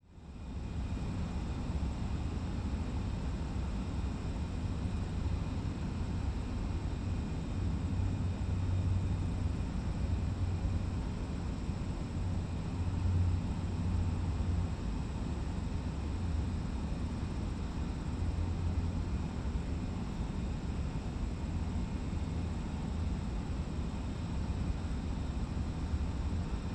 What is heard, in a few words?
Ambience Factory Exterior 180; AdobeCC